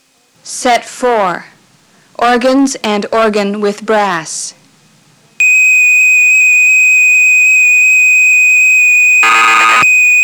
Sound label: Organs And Organ With Brass, dx9